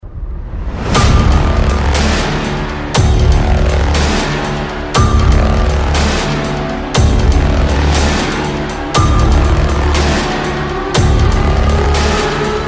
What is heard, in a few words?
HORROR END